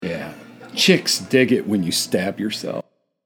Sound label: Stab